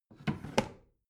bathroom drawer open regular

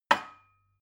glass put down cupboard hard

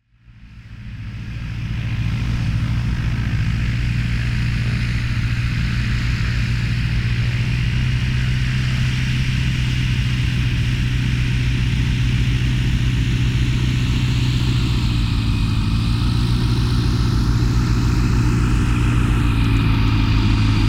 motorized glider runway

